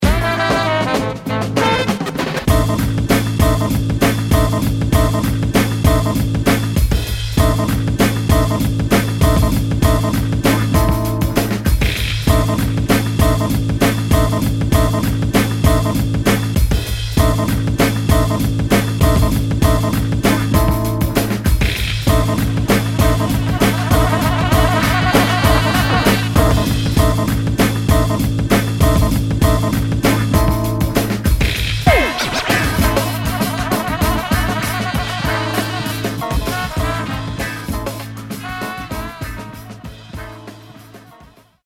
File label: snarl beats